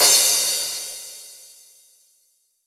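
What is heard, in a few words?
CRASH, Drum Machines; EdgeSounds; Drum Mashines VOL-1; X-DRUMS KIT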